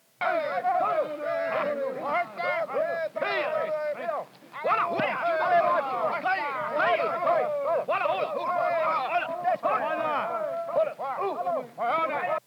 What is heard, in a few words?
Small Group Of Men Shouting